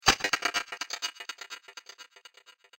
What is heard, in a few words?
Metal Rattle Echo